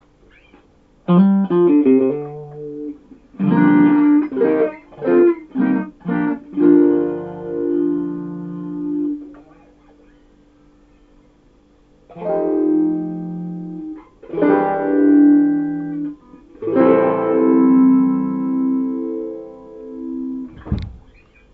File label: MXR 90